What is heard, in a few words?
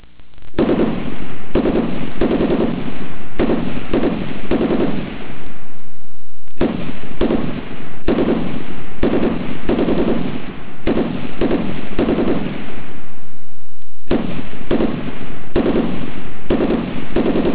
RIFLE